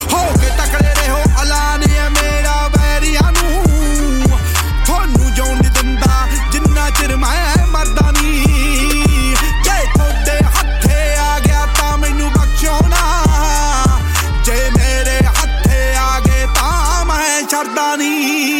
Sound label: Ringtone